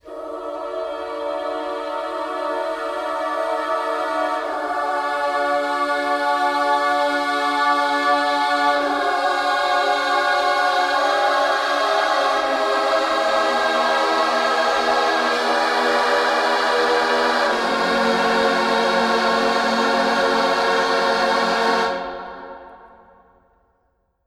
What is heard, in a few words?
Howling; minecraft dungeons howling peaks dlc